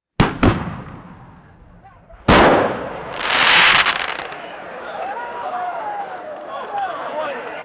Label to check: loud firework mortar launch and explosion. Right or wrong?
Right